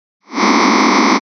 cluster closed quarter A

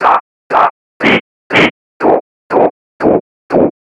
Noise Formant

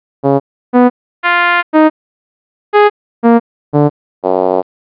Envelope Duration Spectrum

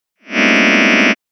cluster closed semi I

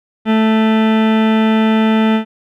Waveform Rectangle

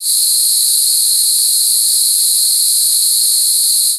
Noise Fricative S

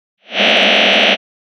cluster closed quarter C

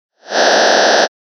cluster closed quarter B

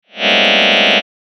cluster closed semi C

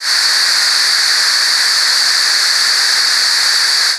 Noise Plosive T

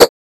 Noise Burst K

